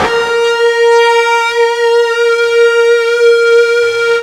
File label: FUZZ A#3, ULTRA FUZZ, Guitar; East West; Steve Stevens Guitar Samples Collection